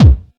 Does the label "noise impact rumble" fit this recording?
No